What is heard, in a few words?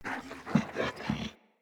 Corrupted Battle Dog Idle Breath Pant; Animals; Vocals